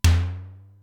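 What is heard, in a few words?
Simmons SDS-9 mid tom, Drum Machines; Factory D3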